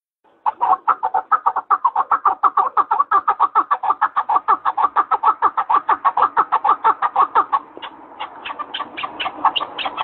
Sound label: partridge sound